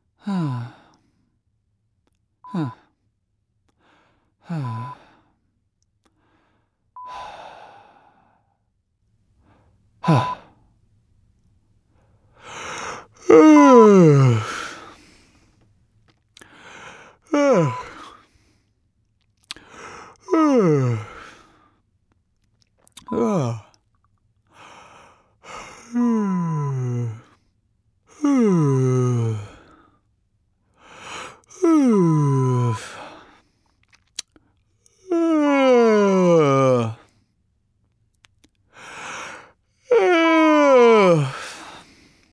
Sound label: Sighs